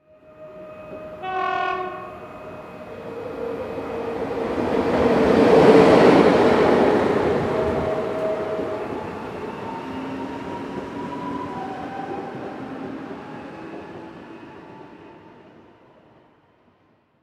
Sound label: train passing; Golden Crayon Sounds